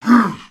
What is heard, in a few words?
Troll Groan